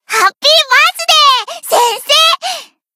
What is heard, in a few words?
cheer; svencoop; voice commands; hl Koyuki